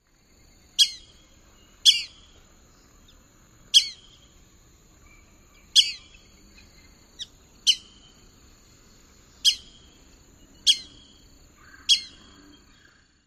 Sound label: p'ew alarm calls